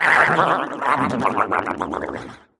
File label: arghblblblblblblbl; smm wario